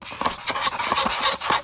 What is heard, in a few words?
spray